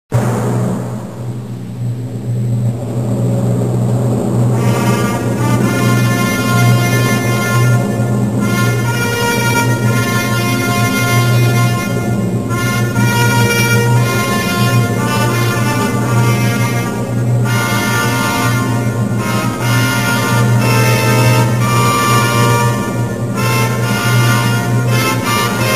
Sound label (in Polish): fanfary; Jasna Gora